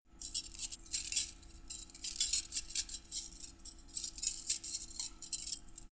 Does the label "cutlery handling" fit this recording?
Yes